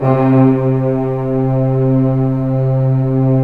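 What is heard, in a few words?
orchestra unison; Roland - Brass, Strings, Hits and Combos; Orc.Unison p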